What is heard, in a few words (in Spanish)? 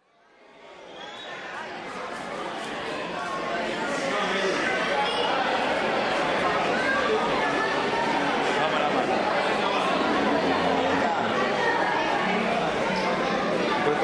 Niños Jugando